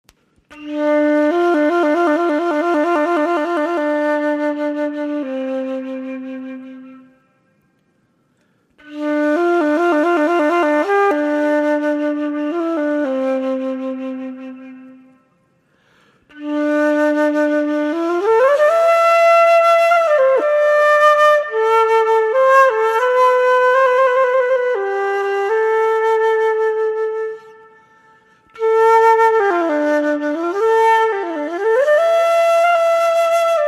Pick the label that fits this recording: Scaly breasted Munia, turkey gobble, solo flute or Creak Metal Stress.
solo flute